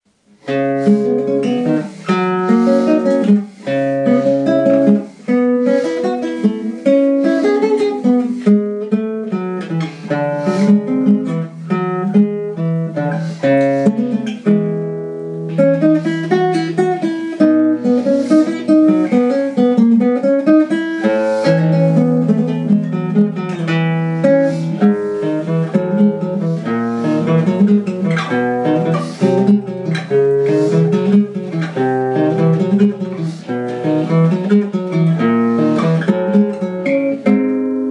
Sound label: Manuel Ponce preludio 10 guitar